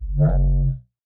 Jumpscare

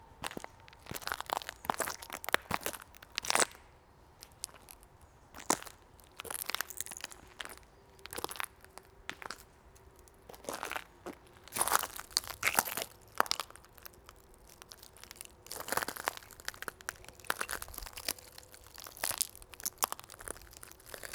Walking on broken glass; SFX; IRL Recorded

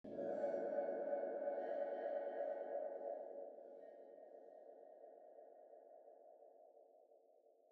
Hidden Base, Ambient; SFX; Ambient - General

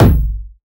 Experimental, drums, kick

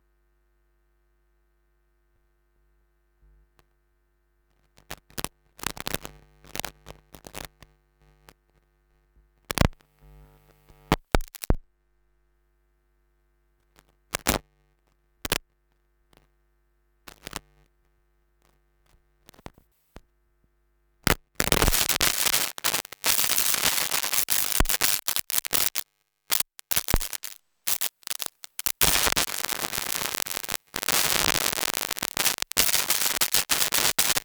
Broken Audio Cable; SFX; IRL Recorded

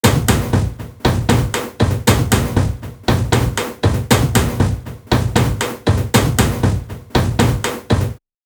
Experimental Textures 118 BPM

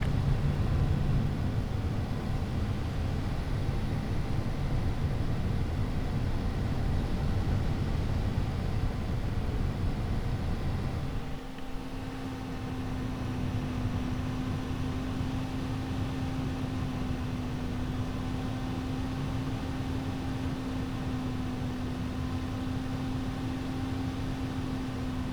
Fans Blowing; SFX; IRL Recorded

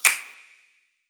Snap, Snares - Claps; Drums

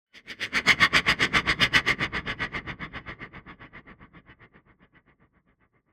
Sweeper; SFX; Ambient - General

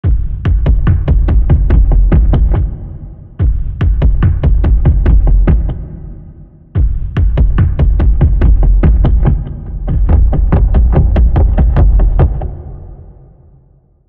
Water Bottle Drums 143 BPM, loops

